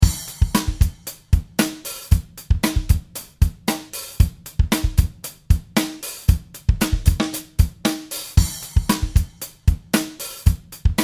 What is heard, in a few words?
Drums Original